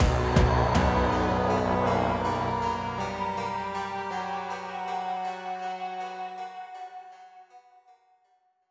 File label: Battle End; Castle